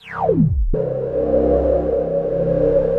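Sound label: reverse sweep; ANALOGICS 1; Back In Time Records - Big Bang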